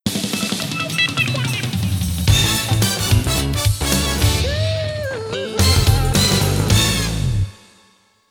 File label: Lose; heat of eleven 98; arcade